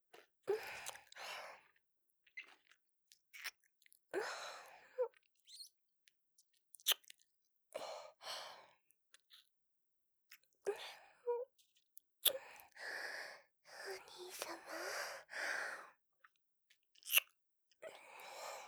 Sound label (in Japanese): キス; リリア